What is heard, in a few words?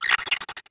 drone chirp